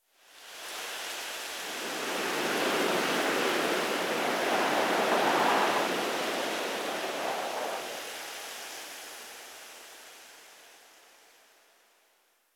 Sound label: wind leafy whistle; ModernWarfare